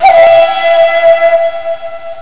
Pan Flute; Groovy Bytes: Behind the Moon

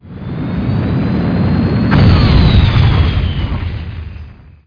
take off

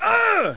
GRUNT; DECATHALON

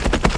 horse gallop

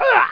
eaah male

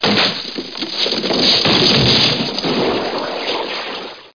DEBRIS

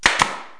Air Brad Nailer; Construction

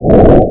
whoosh

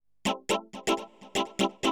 SYNTH HOUSE 125 A; eJay; Keys

